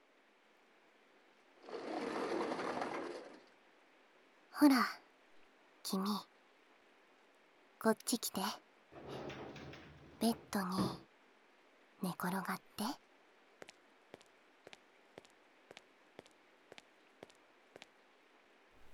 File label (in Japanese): ASMR 『雨音・お耳マッサージ』